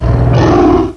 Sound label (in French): Cri Totémique; cris 5G